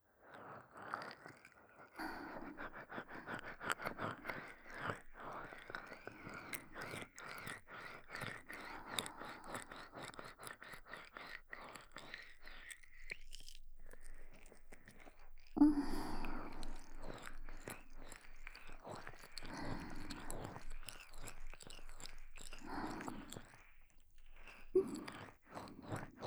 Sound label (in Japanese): W濡れ耳かき（ローション綿棒）ループトラック, ASMR; お姉さん; 一人で両耳責めしてくるプロ級耳"奥"舐めマスターなお姉さんにお耳の奥の奥までほじほじグポグポされる話; "濡れ"耳かき×耳"奥"舐め